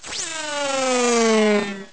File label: Cloud Flash; A cloud flashing; zelda